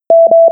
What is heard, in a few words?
error; nopenpilot; selfdrive